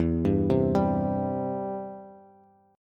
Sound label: E Minor Sharp 5th E down Bass; Em#5 arpeggiated; JGuitar